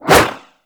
Golden Band Staff Whoosh; Warframe; Monkey King

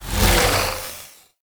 Beam Stop; Vampyric Liminus; Warframe; Entrati; Conquest

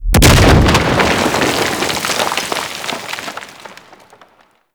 Mortar Impact; Gameplay; Warframe; Tau

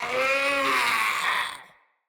Infested Runner Attack Call

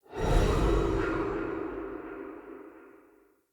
Omen Beast Transmission In; Transmission In Outs

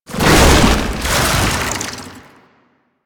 Babau Stagger; Enemies; Warframe; Proto Infested

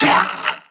Pain, Crewmen; Dialog; Barks; Battle; Warframe; CorpusCrewmen; CrewmanD

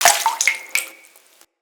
Hut Interior Drinking Well Drips; Ambience; Midrath Island; Grandfather

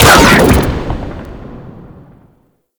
Combat Pod Rocket Launch; Warframe; Vehicles

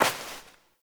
Step Dirt; Warframe; Koumei

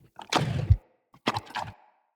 Corrupted Head Teeth Clack; Corrupted Bromius; Wrath Head

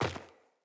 Sandals Land Rock, Footsteps; Player

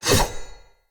Saw Dagger Whoosh Heavy; Weapons; Player; Swords

